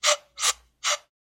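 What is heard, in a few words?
Bicycle Horn; SoundsCrate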